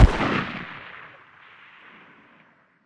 arl dist atk tail; Titanfall 2

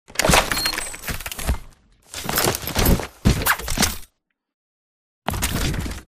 grateful; Commander; ash; Titanfall 2

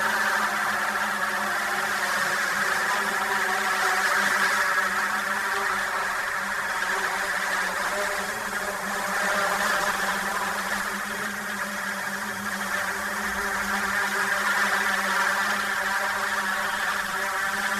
Drone Hover Close; Titanfall 2

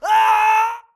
grunt death crush; Titanfall 2

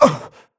grunt death neck; Titanfall 2

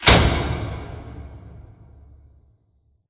cooling panel start; Titanfall 2

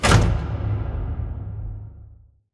sliding door lg verb open stop; beacon; Titanfall 2

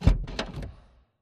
Sentry Turret Body Servo Stop; Boost Card; Titanfall 2

